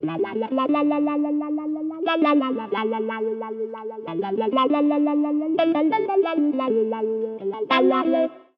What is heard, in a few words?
Tremolo Lick; creative wah pedal use; ragajunglism